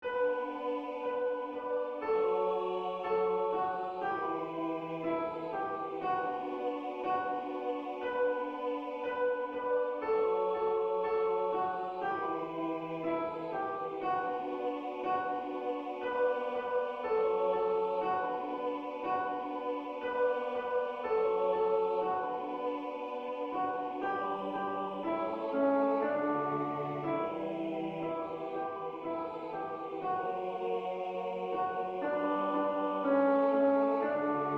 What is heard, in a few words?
alto tutti